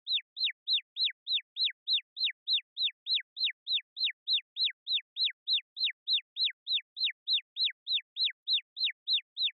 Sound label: Motion Dizzy Slow Loop